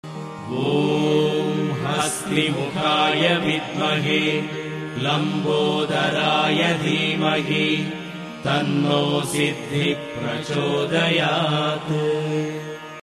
gayatri male; ganesha (lambodara)

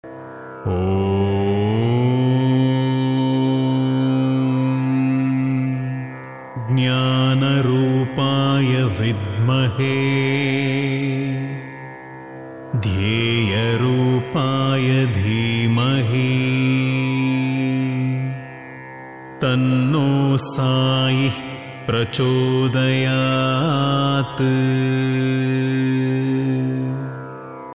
sai baba shirdi gayatri

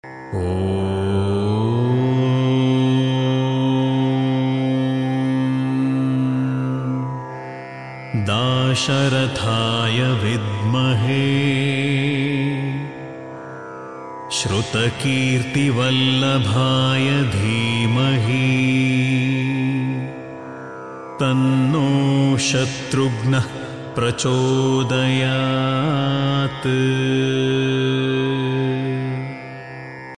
shatrugna rama gayatri

